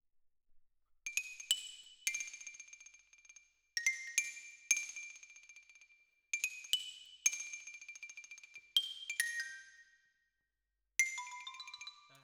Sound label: Xylo